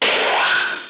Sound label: ST PHASER